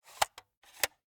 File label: Viewmaster, plastic, lever, load release; Eiravaein Works; Vaeyan IV